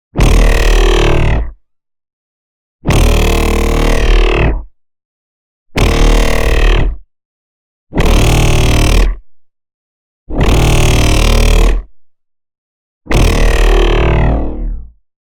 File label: NEON BLAST; Sentinels Call; boom library